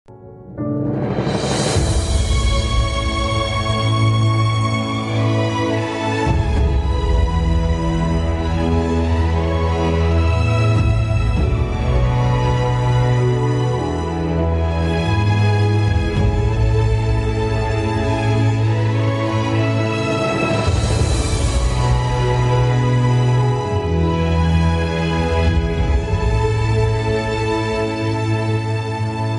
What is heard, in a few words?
instrumental